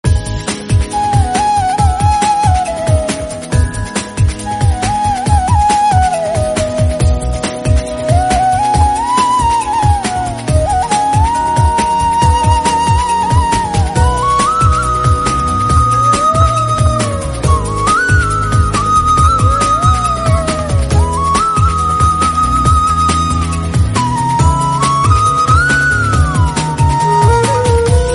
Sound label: background music; takkar